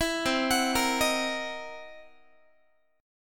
C 7th Sharp 9th Flat 5th, C, down, Guitar; arpeggiated; JGuitar

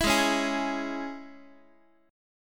Gsharp Suspended 4th Sharp 5th, Guitar; strummed; x,x,7,6,5,7; 4A,4E,5A,5D,5G#,6C#

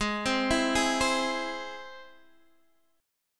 G sharp Augmented G sharp, down, Guitar, Standard; arpeggiated; JGuitar

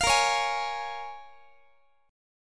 A sharp Minor 7th, A sharp, down, Guitar; strummed

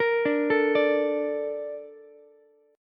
A sharp Major 7th, Ukulele; A# M7 arpeggiated

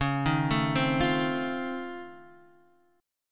F 7th C sharp; arpeggiated; chord; JGuitar

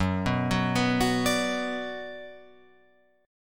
F sharp Suspended 4th Sharp 5th, Guitar, Open F; arpeggiated; F#sus4#5

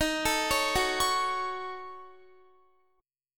D sharp Minor 7th Flat 5th D sharp down Guitar; arpeggiated

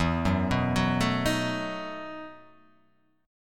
E Major 7th Suspended 2nd Suspended 4th

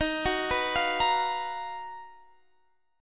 B Major Sharp 11th D sharp

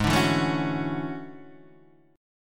E 13th G sharp

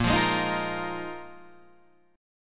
A sharp 7th Suspended 4th A sharp; strummed; chordshape; 6,8,6,8,6,6

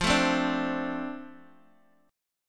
F 6th Guitar Open G, chord

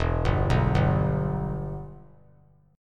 E-Minor; arpeggiated; JGuitar

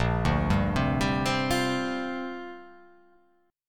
A sharp 6th Add 9th

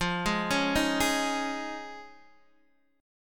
F Minor 7th Sharp 5th Guitar Open D, chord; x,8,6,7,6,6; down; chord shape

